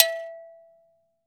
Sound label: afro ago, afric ago go